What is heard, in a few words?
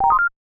Arcade Retro UI